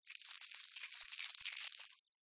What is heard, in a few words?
household jewelry bracelet metal handling; zapsplat